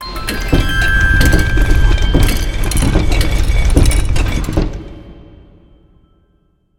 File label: start free spin click; lost relics